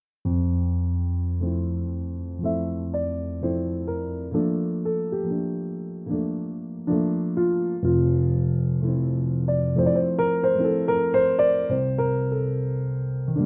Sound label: Jazz Instrumental Chill Cosy Jazz Piano